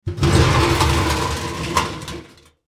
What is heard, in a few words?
Garbage Can Push, Garbage Can Push Metal; Foley; Household; Blastwave